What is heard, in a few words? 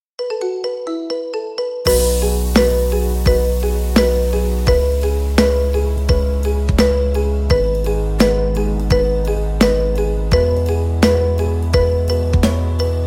Female Marimba Remix